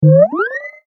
multimedia rollover, Multimedia Rollover Tone

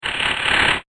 Gnarly Whoosh; Whoosh Sound Effects

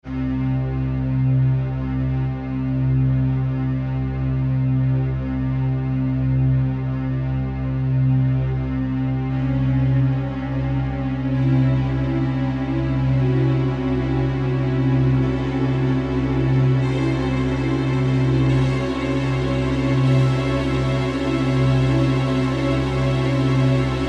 Horror Musical Strings Crescendo Dissonant; Crescendos; Blastwave

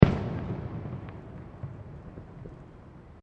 Fireworks Single Explosion; Backgrounds; Crafted Signals

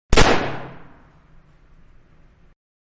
Guns Magnum 357 Revolver; Guns-4 Inch Magnum 357 Revolver-One Shot; Manhattan Production Music

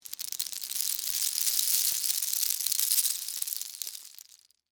Debris Penne Rigate; Texture; BlastWave

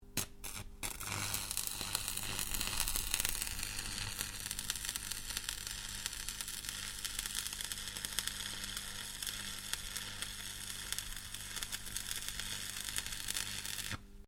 Welding Arc Long, Arc welder; Welders (Sound Effects:Machines)

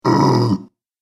Giant Ogre Angry; horror; monster

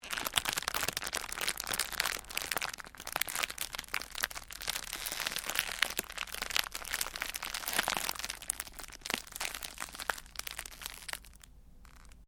Cellophane crinkle

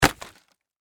Industry Hammer Impact Dry Wall; Tools; Hammers

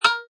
Click Electronic Metallic Beep; Scifi Production Elements, Set 2

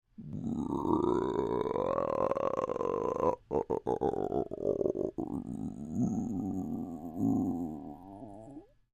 Creature Groan Throat Rumble, Human; Groaning; Voice

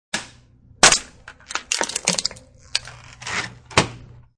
Can Crusher, Using a can crusher.; Crunches; Objects

